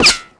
成功防御; 红色警戒2; 超级武器音效

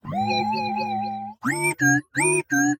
示警的哔哔声; 守望先锋; 堡垒

